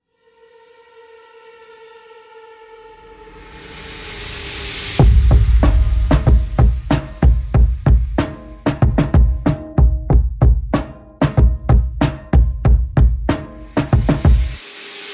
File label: Ditzy Pizzy No Strings